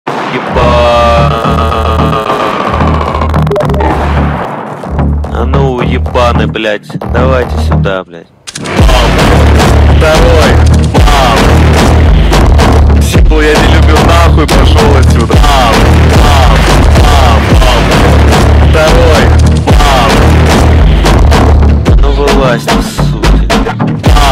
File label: Brazilian phonk remix